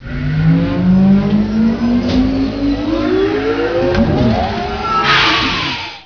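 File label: power up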